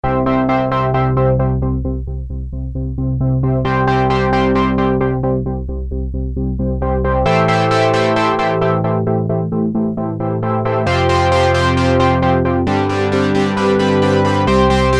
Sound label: DUSTY DCO ECHOES; redshift 6